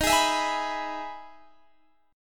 E Major 7th D sharp, chord

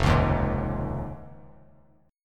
F Minor 7th Sharp 5th; JGuitar

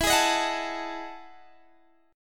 E Major 11th E down Guitar Standard; EM11 strummed; JGuitar

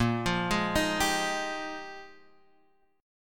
Bb 13th Suspended 4th; Bb13sus arpeggiated

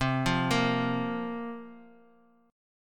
C 7th Suspended 4th, guitar; C7sus4 arpeggiated

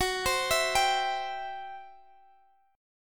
C Major F sharp

